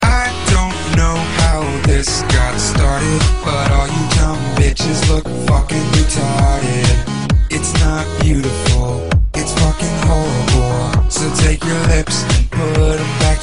duck song; animals

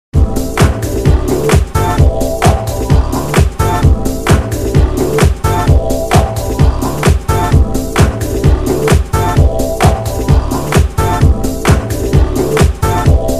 funky disco beat

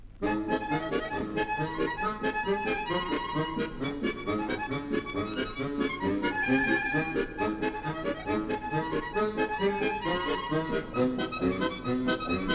classic sound; classical

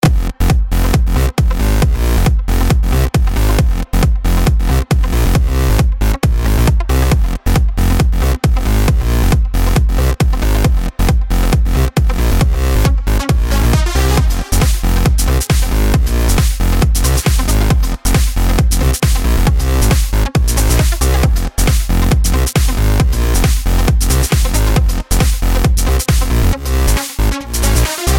FILTHY BASS

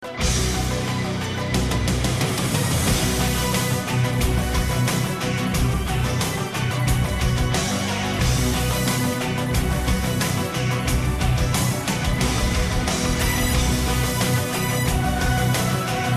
tune; iry tail

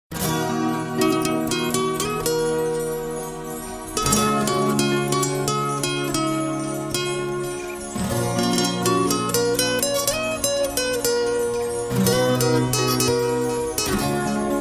superb violin tone, jazz; ringtones

